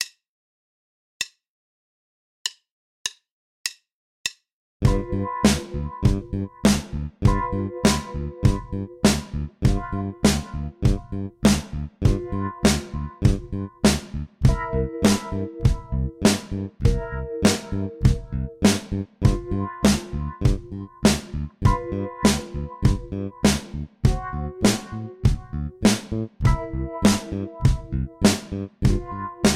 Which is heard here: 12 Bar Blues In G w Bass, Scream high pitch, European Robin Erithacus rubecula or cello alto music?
12 Bar Blues In G w Bass